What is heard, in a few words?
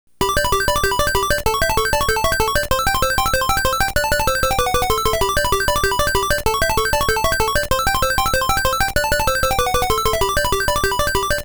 Bonus Game Theme (Bonus); Super Mario Land; DMG; GB